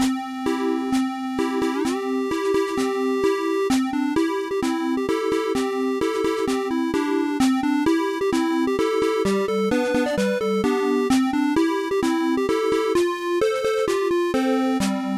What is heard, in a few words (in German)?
Marsch